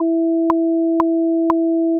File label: slowed impulse 330hz, slowed and reverb